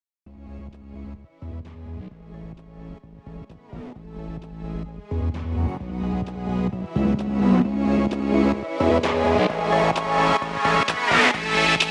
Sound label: autofilter; GarageBand; Apple Loops